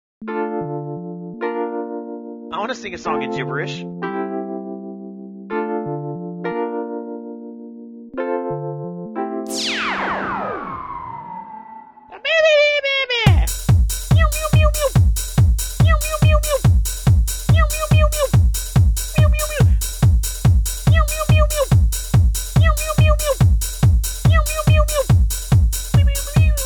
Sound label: Cat Techno